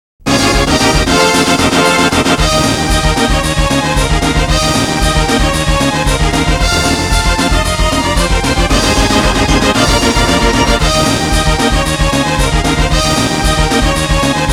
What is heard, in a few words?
Winning Jingle; mario tennis 64 original sound track